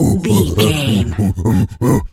gorilla chatter

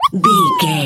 voice fun character flying cartoon

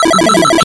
retro synth beeps fast

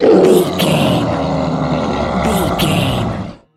troll monster battle shout

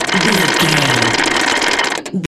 gun auto barrel spin slow

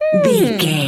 voice fun small character emote interested

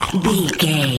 zombie voice eating chewing

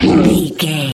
troll monster hurt pain short

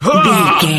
voice male attack groan

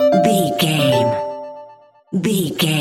jingle chime positive

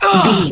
voice male hurt pain

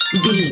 point sticker bonus score reward loop

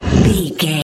troll monster effort growl small

